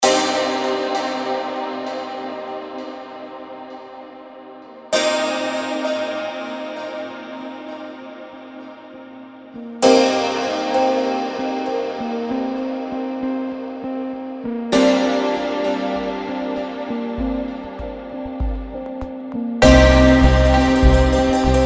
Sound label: santur; kontakt